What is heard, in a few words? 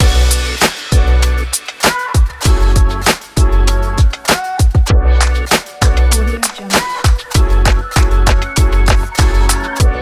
podcast open logo pack